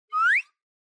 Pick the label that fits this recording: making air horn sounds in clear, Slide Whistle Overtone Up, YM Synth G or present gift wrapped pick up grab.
Slide Whistle Overtone Up